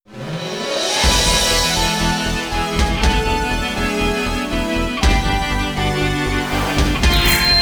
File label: Course Clear; Tokyo Xtreme Racer Drift 2; PS2